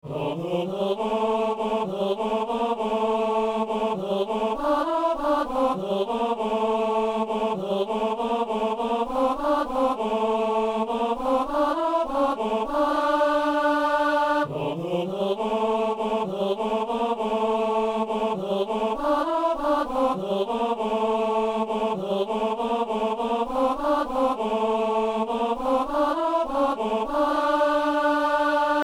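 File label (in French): ténor; messe coutrillonne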